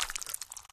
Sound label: slosh; Lewd SFX